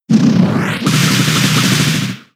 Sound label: Whip; attack moves; Pokemon; gen 6; XY; ORAS; Power Whip part 1